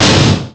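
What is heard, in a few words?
Collision Exterior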